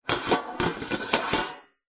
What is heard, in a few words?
foley metal tray lid rotate turn spin on metal short